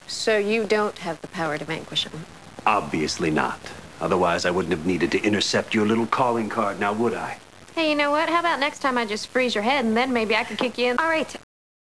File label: kick